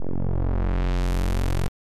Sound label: alert; Buck Rogers